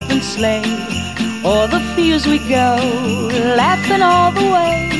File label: Jingle Bells